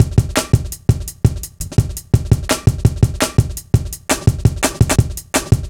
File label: Live Wire, Drum Loops, 168.5, Jungle; Zero-G Jungle Warfare 1, 2 & 3